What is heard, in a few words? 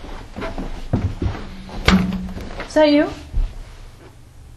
Sigh